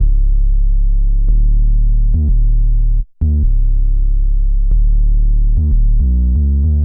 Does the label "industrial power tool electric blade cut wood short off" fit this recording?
No